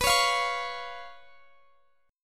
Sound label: B Suspended 2nd Flat 5th down Guitar Standard; Bsus2b5; strummed; capo=2